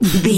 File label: voice male breath struggle